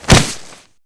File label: Orc Captain Die; Seer; GamigoNA